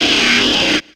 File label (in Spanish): Grito de Marshtomp